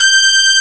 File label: brass G5; ClockWerx